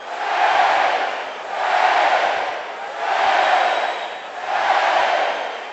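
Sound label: Basketball Crowd Chant Loop; Crowds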